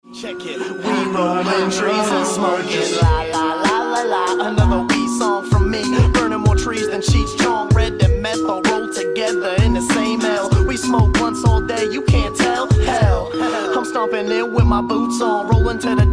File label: music; jailbreak